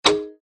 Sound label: wheel click spin btn